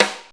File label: Good Acoustic Snare C Key, snare one shot tuned to the C note